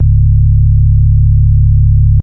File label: sustained 3 octave, Jungle Bass